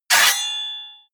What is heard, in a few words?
hit metal sword medium